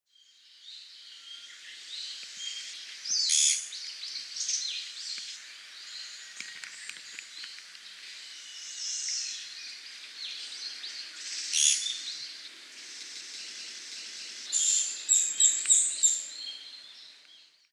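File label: kogera, Japanese Pygmy Woodpecker; koe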